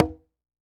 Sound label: H CONGA, CONGA